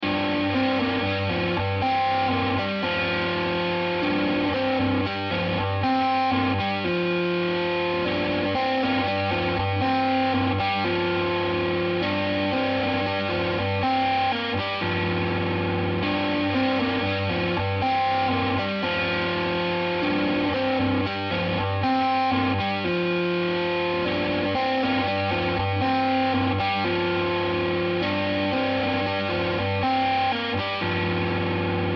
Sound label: G120 Riff